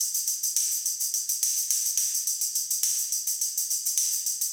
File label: Garage Funk Tambourine, 70s Analog Funk; Sonic Foundry (Sony Creative Software); What it Is!